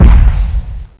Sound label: rocket lauch, comanche; nst monster